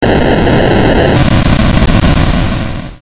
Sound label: retro; maoudamashii